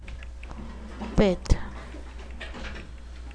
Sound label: beat